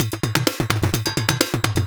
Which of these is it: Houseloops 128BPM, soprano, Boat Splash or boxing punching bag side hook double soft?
Houseloops 128BPM